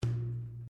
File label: Wooden Column Impact Fist; Material components for constructing more complex sounds